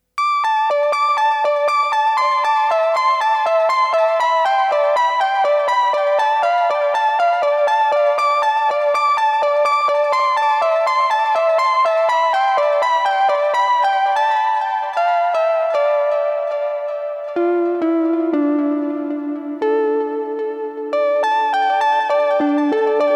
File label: guitar; antilles